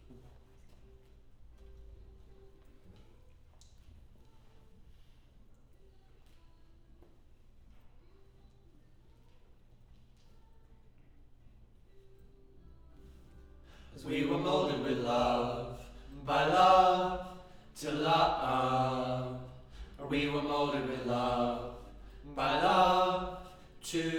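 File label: Choir Harmony Low Mid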